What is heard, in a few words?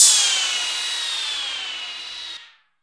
PROCYMB, CYMBALS